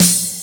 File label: CRASH; USB Soundscan vol.27; FILLACOUS